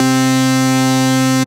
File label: C Synth, One Shots